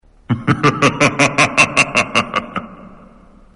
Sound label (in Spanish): risa malefica; mortal combat